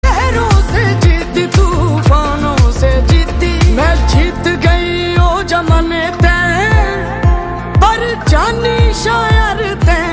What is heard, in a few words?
Hindi Ringtone